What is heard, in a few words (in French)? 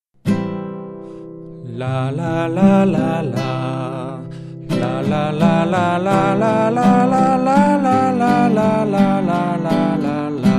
echauffement guitare la